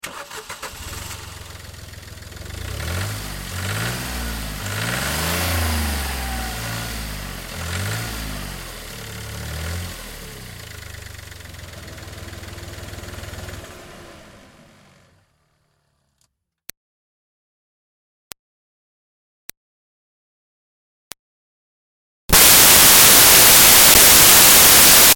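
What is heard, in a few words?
Car Smart Car Onboard Revs; 2005 Smartcar Fortwo; Vehicles; Big Room Sound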